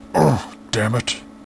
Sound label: pain; hassault